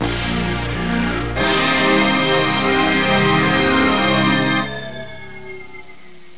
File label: Horror Organ; horrorgifs